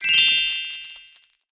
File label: win